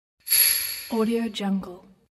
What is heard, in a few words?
technology notification